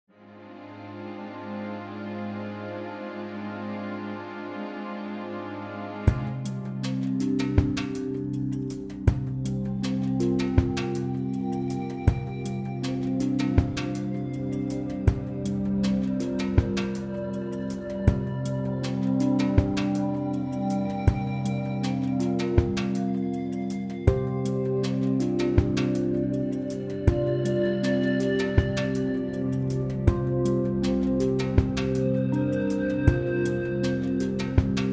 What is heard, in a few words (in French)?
Dialogue calme